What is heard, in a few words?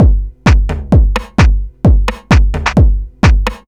tick tock tom